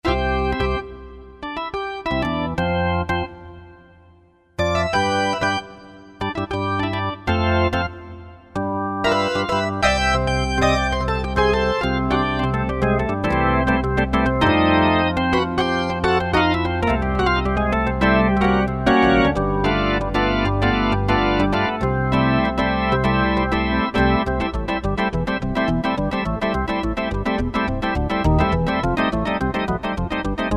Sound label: Organ 3, Korg T3